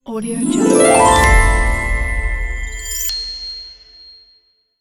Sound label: christmas logo stinger